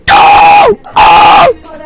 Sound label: screamin; dayz